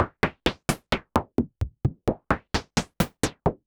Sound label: Modular 130BPM; noise hiss crackle